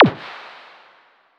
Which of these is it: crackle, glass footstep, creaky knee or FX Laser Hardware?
FX Laser Hardware